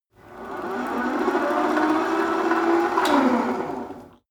rope trainer pull sequence slow short

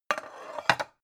pan stack slide soft

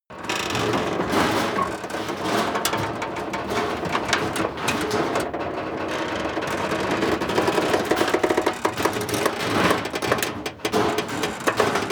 plane cargo area rumble creak; flugzeuge verkehrsflugzeuge; verkehr transport

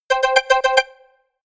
notification call; signale

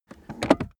car accessories armrest close

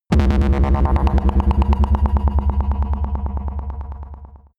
stutter shock low, drones

